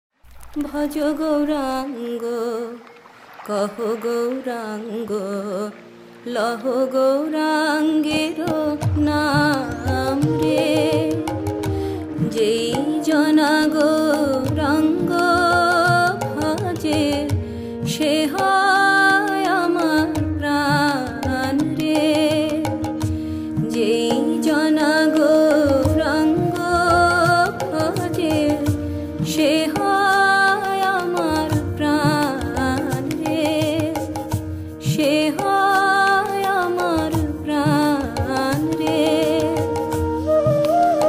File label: Bhaja Gauranga Kaha Gauranga Sung; Bhajans by Krsnadasa Kaviraja Goswami